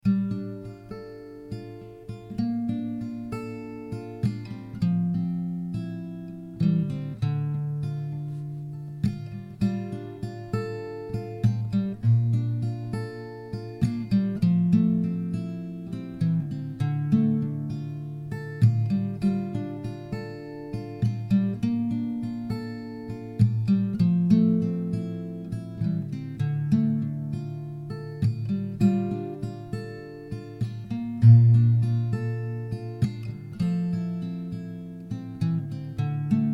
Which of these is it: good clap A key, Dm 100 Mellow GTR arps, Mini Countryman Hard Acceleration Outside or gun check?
Dm 100 Mellow GTR arps